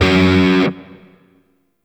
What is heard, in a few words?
Shawzin Oct One F Sharp; Warframe; Grineer Shawzin